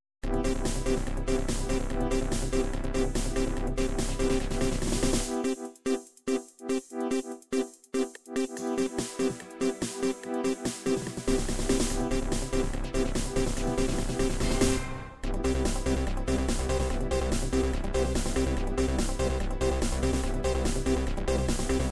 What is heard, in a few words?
techno